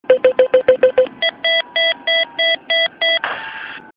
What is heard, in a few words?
EC beep